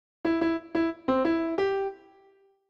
Ground Theme Main Motif

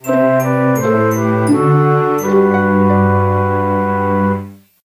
Card Summon Leave

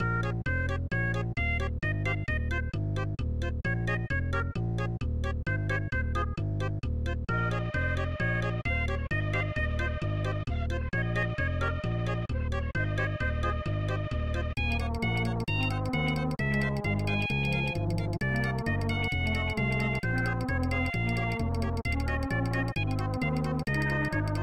Creative Exercise; Mario Paint Prototype

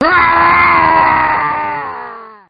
scream; Wizpig

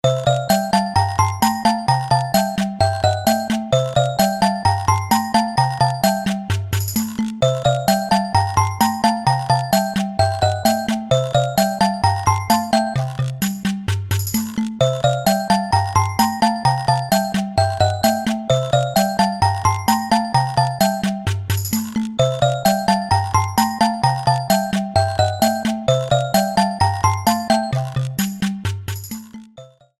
Coaster Double Mix

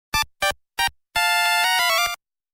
Game Over

